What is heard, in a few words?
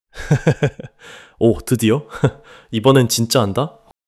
laughter